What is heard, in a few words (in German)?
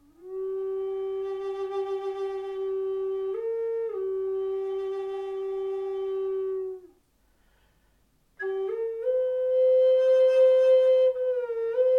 Flöte G